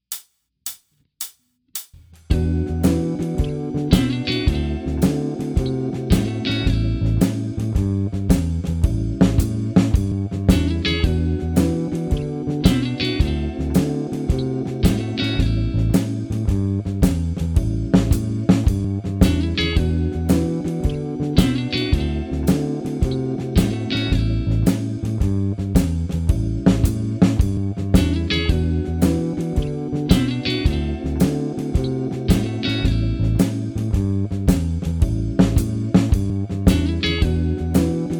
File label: Bump Blues E7 D7 A7